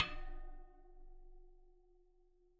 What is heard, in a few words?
Bicycle Spoke